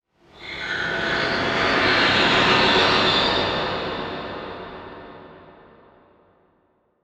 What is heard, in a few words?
Production Element Title Transition Demonic